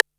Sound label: Boss DR-660 Snare, Drum Machines